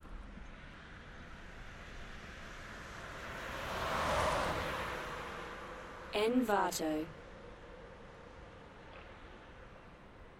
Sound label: traffic right to left car passing